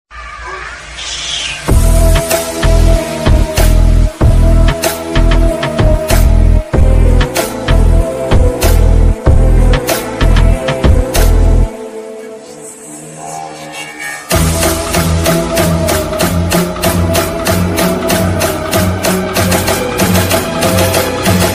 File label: english ringtone